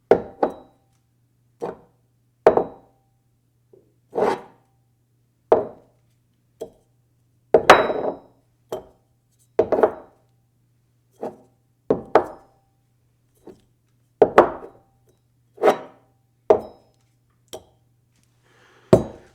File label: metal container on wood table